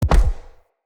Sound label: Bow Shot Punch; Weapons; Player; Josalfar Bow